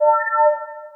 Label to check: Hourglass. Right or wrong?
Right